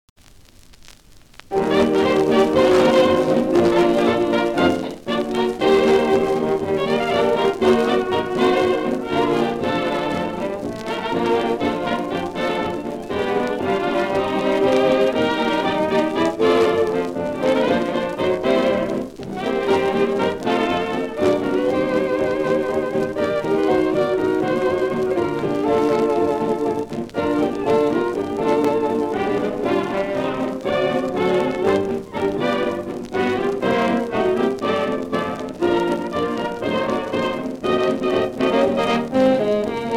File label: instrumental, Jazz; Popular Jazz Archive 1920-1936